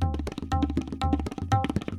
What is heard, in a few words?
tabla 120